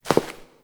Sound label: Step; Warframe; Koumei